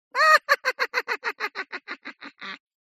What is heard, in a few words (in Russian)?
smeh multyashnyi